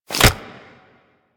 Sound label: Nunchaku Equip; Warframe; Tenno